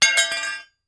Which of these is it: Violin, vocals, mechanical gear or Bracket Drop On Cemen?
Bracket Drop On Cemen